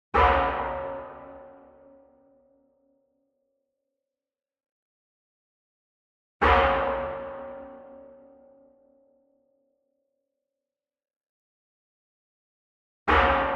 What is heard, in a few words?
Sweetener Metal Pan Hit Hard Beater Far; boom library; Cinematic Strikes Construction Kit